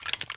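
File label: movement; Valorant; classic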